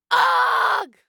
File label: Aaugh